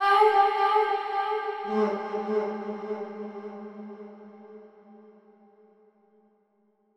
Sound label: 110bpm E, Vocalpack; Vocals